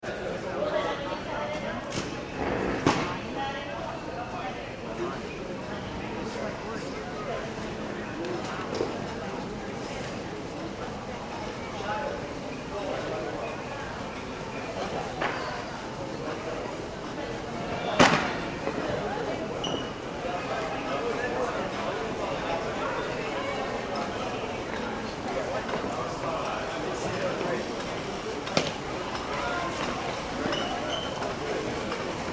ambience airport arrivals hall; Airport Ambience - Arrivals Hall - John F . Kennedy ( Jfk ) Airport; Airports; Backgrounds; McKinney Sound

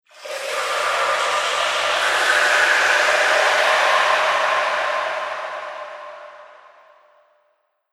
Drip Reverb Whoosh; Whoosh Sound Effects

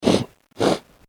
Man Sniffing Runny Nose; Sniffling Sound Effects

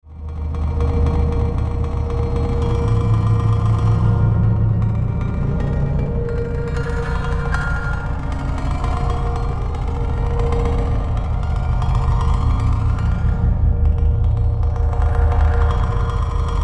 Drone Metallic Phaser Warble Clank; Blastwave

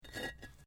Brick Medium Hollow Move; Material components for constructing more complex sounds; Rock Sound Effects

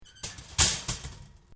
SHOPPING TROLLEY CHILD SEAT CLOSE; Stores and Malls

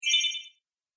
Game Magic Wand, Video Game Sound Effects

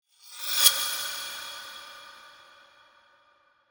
Horror Scrape Metal, Dark; Production, Element, Horror, Scrape, Metallic, Dark; Scary Soundscape Sound Effects

